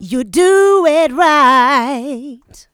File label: voc, female vox, Vocal House